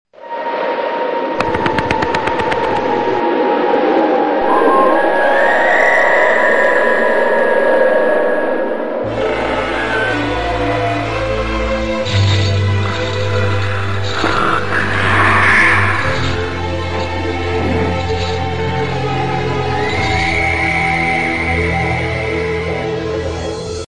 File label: horror castle